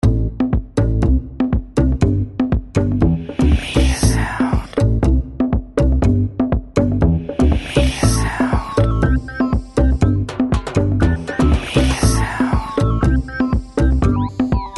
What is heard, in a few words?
Supercrush - 120BPM, House - Dance